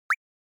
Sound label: Anime Motion